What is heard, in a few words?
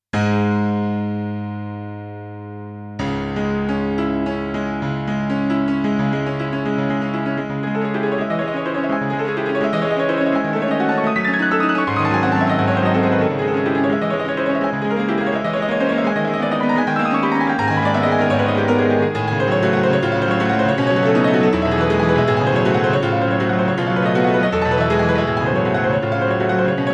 SK-88Pro, piano